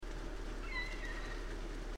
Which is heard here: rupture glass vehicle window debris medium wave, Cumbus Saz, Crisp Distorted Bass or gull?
gull